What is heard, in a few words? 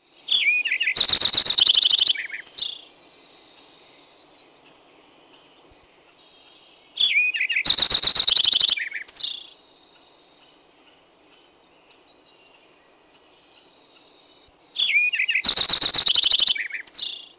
lincolns sparrow